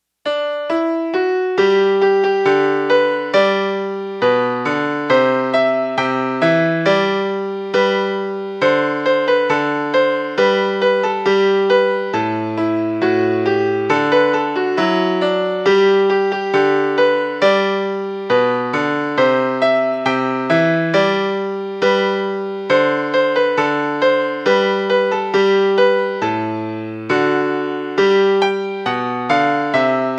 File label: melody